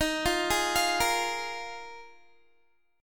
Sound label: D sharp Suspended 2nd Suspended 4th D sharp, Guitar; arpeggiated; 22,20,20,22,22,x; 4F,4A,5C,5G,5C,6E; chord